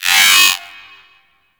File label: Minigame Intrusion; Corpus